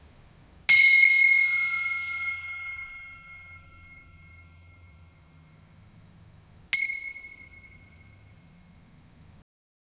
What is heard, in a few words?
trident hitting stone flo